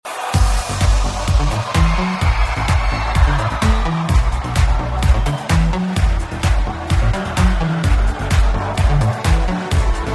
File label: vox male